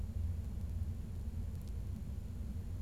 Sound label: bengalese finch; bengalese finch bl26lb16